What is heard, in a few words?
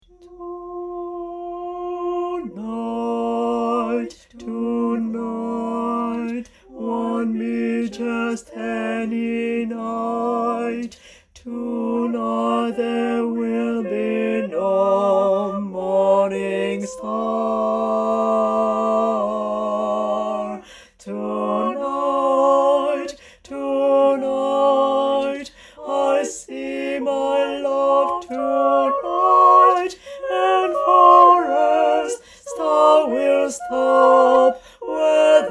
basses; version chantée